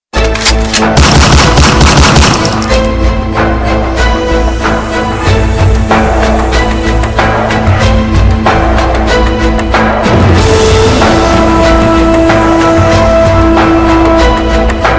Remix

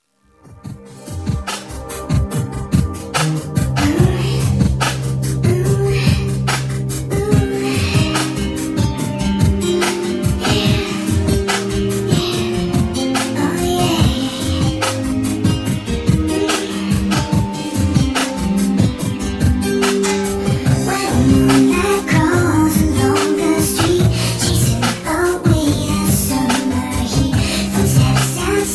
Baby Singing

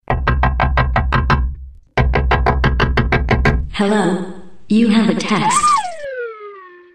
Knock U Have Txt

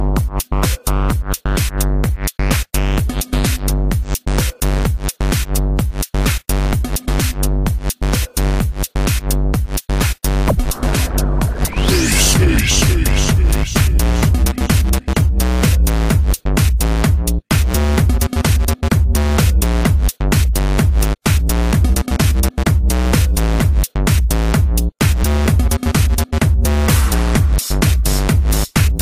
Electrohouse Beat

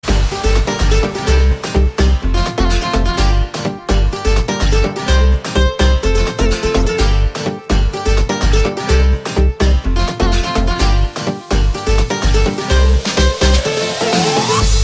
Best Guitar

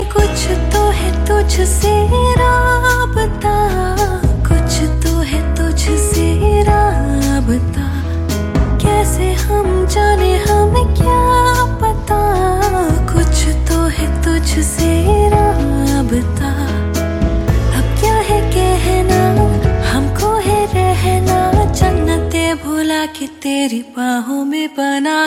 Female

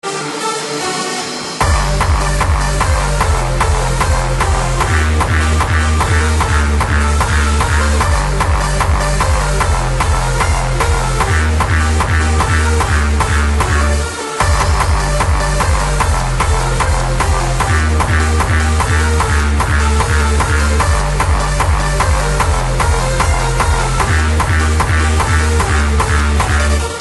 Hardstyle

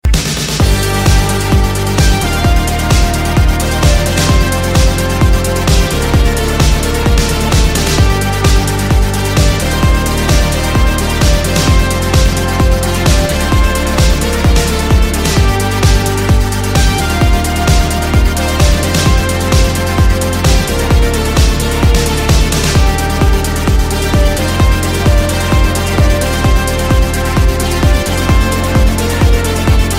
Elevator

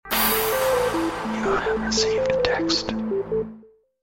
Recieved Txt

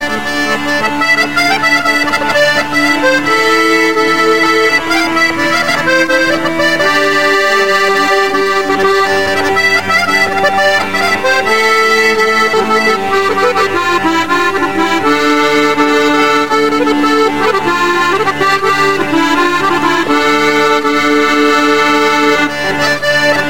Circassian Music

